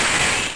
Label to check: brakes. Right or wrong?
Right